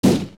Bongo Bongo Clap; zelda; OOT

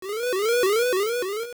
Move; Nightmare Shadows moving around the room

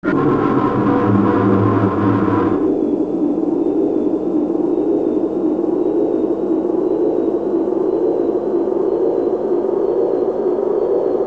Jabu Jabu Inhale

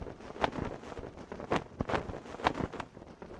Deku Leaf floating

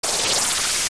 ReDead Freeze; zelda; OOT

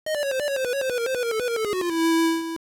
Die, dying; LOZ; Link dying

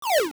Throw; Link throwing an object (also using Roc's Cape, Ramrock [OOA Boss 8] getting wrecking balls, and more); Oracle

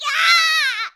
Burn; getting burned; Link getting burned

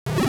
Enemy Hit, An enemy getting hit; LOZ

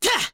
Attack

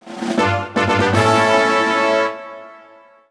Fanfare Small Item; TP

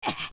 Push; Link pushing a large object; Adult Link

